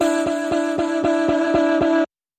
Eccentric Echoes Vocals

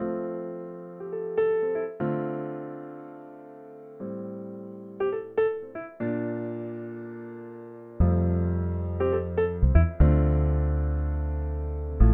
Strings & Accordion

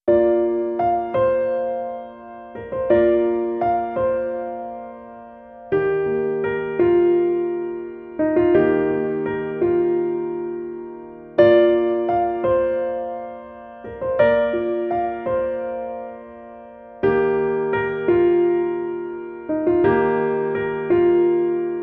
Piano Only

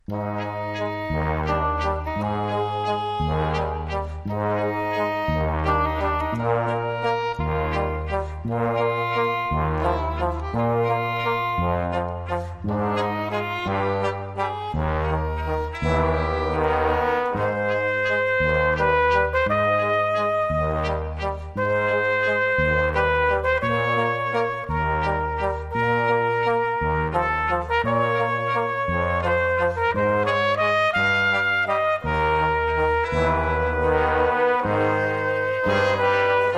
Serenata Waltz, Light

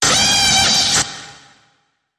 Alr Prison Scream

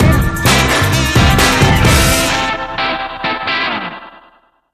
Twist Inst Stinger

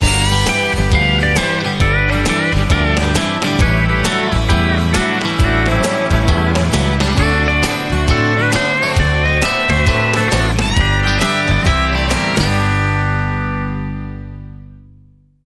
Country Boogie

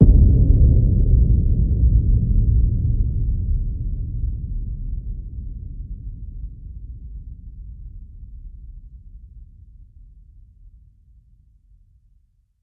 Hit Low Drumfire

